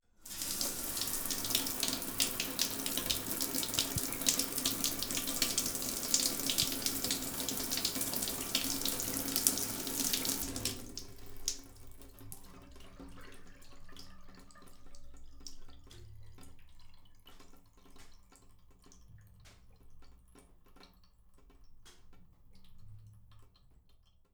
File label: LIQUIDS - Shower start, stop, and dripping; Game Audio Factory; Liquids Materials